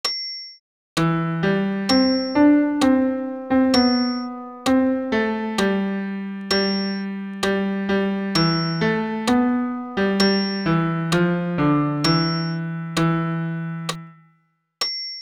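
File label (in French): Credo ténor piano seul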